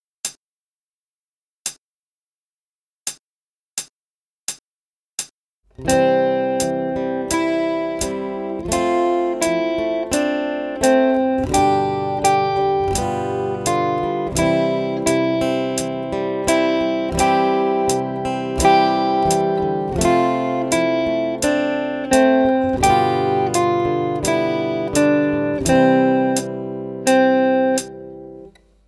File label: 3 Strings fast, guitar